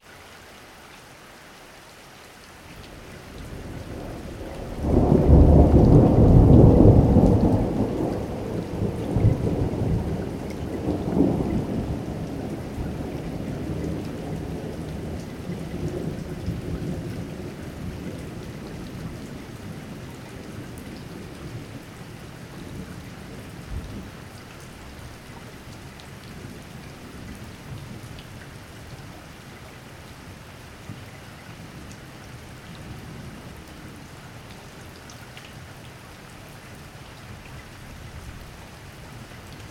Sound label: weather thunder rain light; sound effects one